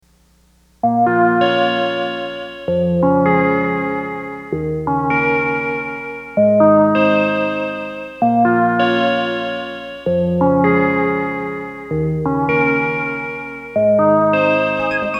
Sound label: (130 BPM)